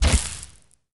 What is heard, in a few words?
overheat, plasma; plasma weap